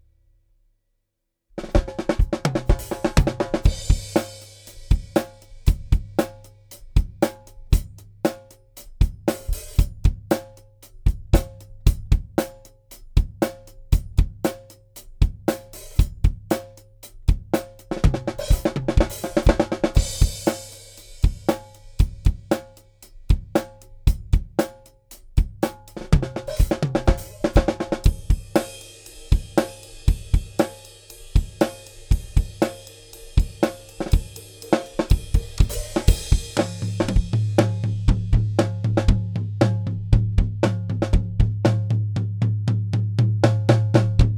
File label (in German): drums abmischen rough mix